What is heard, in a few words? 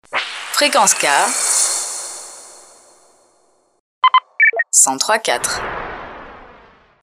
jingle